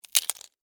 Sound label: Insect, Eat, Bite, Bug; Insect Eating Sound Effects; Insects Eating (Sound Effects:Animals:Insects); Blastwave